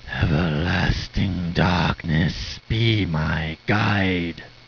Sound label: axe; quake; fortress